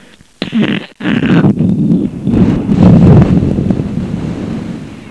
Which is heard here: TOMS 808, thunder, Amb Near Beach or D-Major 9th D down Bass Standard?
thunder